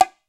E BONGO, World Percussion

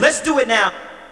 VOX GENERAL; Megabass Remix! 2

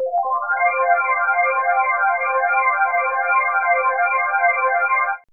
SEQ LOOP, ATMOS

